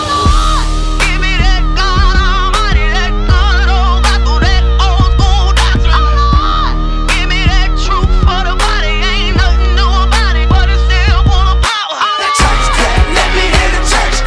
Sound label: Church Clap; Bryson